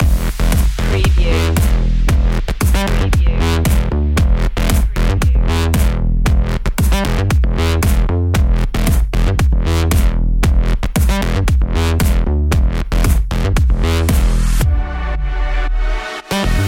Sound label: cosmic funk loop